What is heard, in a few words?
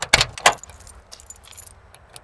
car unlock; Mafie 1